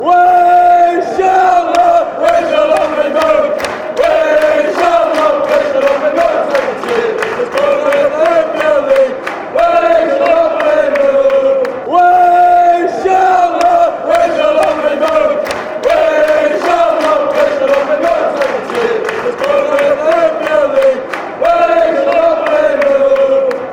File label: we shall not be moved, fanchants; sunderland